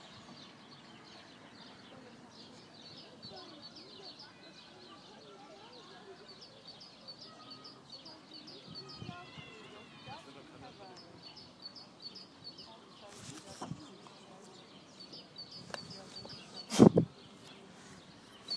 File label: sounds by the canal